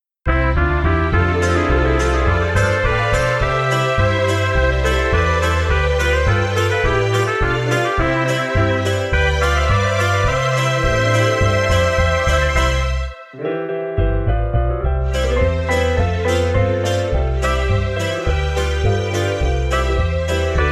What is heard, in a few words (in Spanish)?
Feliz Cumpleannos mariachi pista